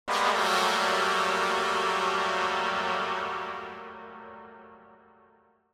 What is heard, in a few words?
Horn Section Warrior Signal - Derisive; Hollywood Edge; sound designer's toolkit v2